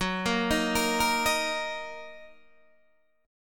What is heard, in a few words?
Fsharp 6th down Guitar Open D